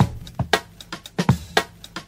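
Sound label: no kicks, funky drum loops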